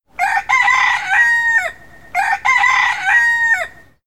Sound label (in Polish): kogut